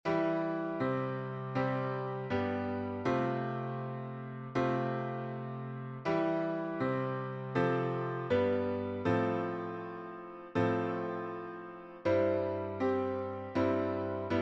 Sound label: SATB (piano synth); Little Free Music Library